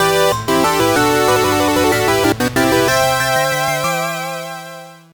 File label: Fanfare